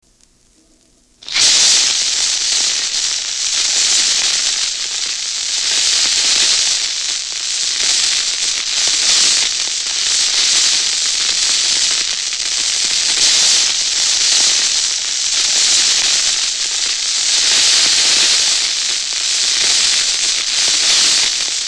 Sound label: Fuses And Explosions; Disney